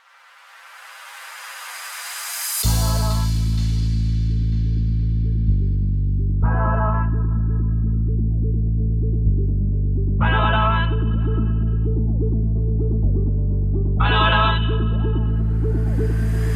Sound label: intro outro 124 bpm